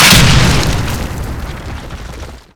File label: stone close, Explosions